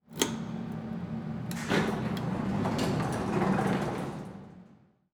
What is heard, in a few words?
Elevator Open Door With Key Maximum Security; Emergency; AdobeCC